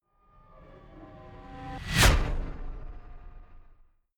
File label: fly by hover car hum high